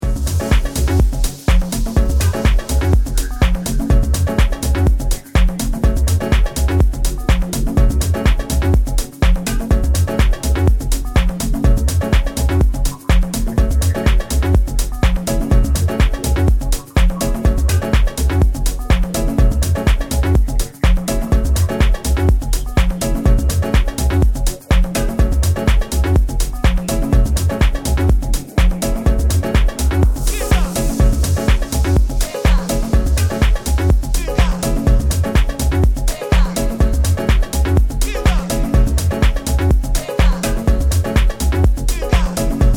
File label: Piano